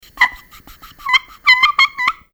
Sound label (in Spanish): ritmos